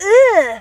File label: disgust